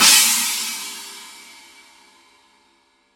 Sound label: Paiste PST5 CHINA CYMBAL 18 INCH